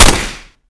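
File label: mac10, weapons; csof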